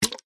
foley plastic disc container small full of liquid catch